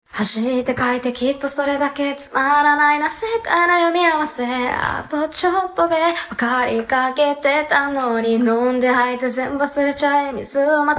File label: vocals